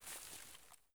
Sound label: Grass Move